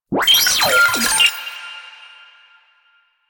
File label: Sniper Drone Vocal; Warframe; Lasrian; Lasrian Drones